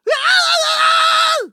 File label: scream wobbly; ice age scrats nutty adventure; Sky Diving